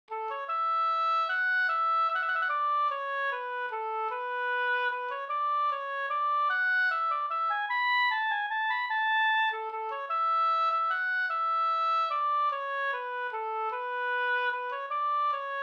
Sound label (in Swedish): vals